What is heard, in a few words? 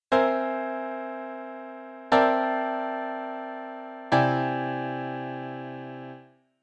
Chords for Various Epsilons